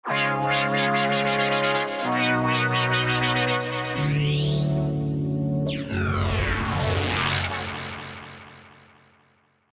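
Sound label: pad, Chilled, tropical pops; mango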